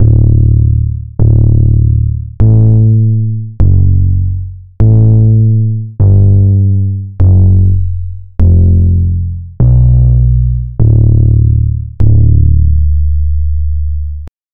a distorted and punchy, bass

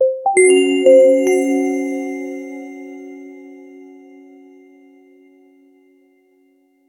short quiet chime like so; wild west

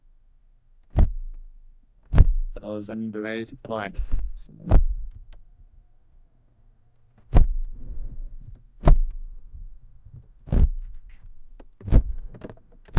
asmr hands on leather car

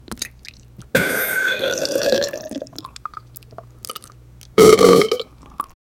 a guy eating drinking, drinks

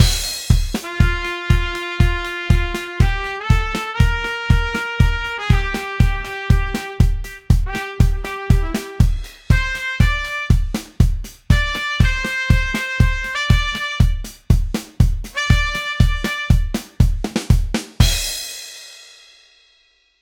smooth blues with light

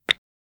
kiss sound

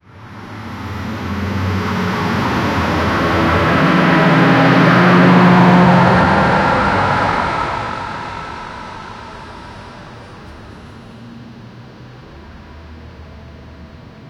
tractor approaches and, approach

